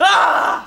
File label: ah pain